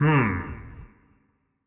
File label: Grunt; Going Under; Marv